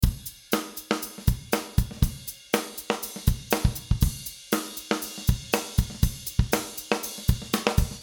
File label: Drum Real Percussion